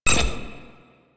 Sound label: Flying Robot Beep; Warframe; Veilbreaker; Quests; Ambience; Kahl